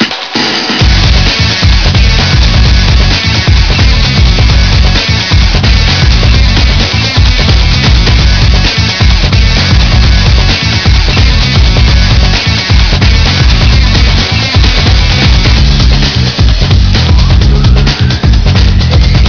techno

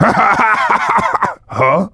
cheer; svencoop; voice commands; SeriousSam

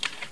ak74 mag out; firearms

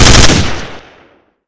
famas burst, weapons; svencoop

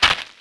dirt, player

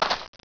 footstep dirt; footsteps; gearbox

